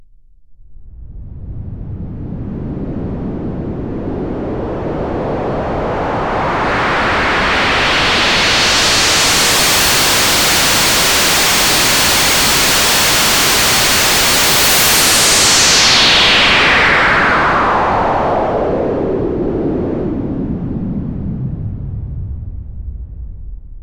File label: noise filtered, synth